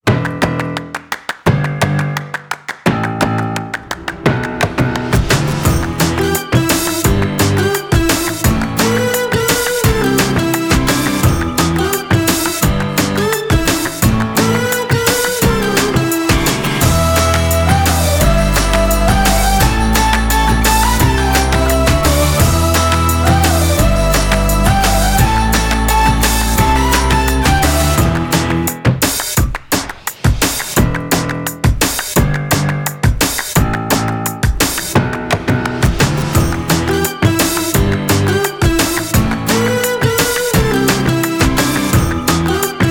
Anthem Of A Quirky Hipster, Millennial Uplifting Bangers; Artlist; Drunk-Fu: Wasted Masters; android